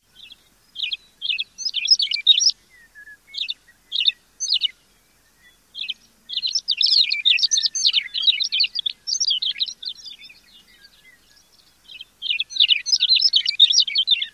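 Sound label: songs, bird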